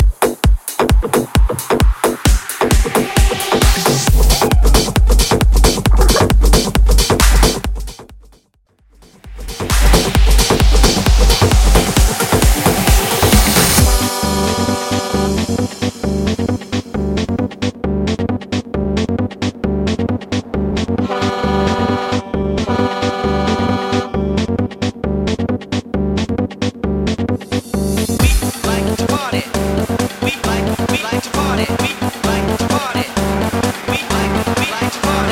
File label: 90s Bootleg Club Mash Up 128 Bpm; Vegas Edit